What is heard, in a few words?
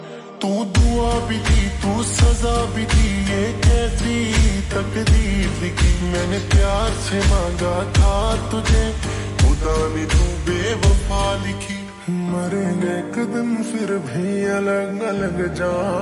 Slow Reverb Version Ringtone